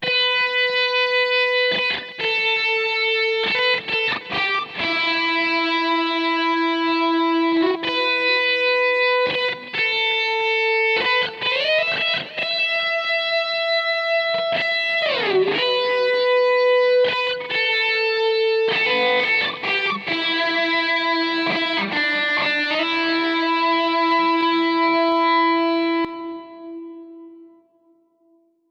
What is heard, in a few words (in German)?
E GIT Lead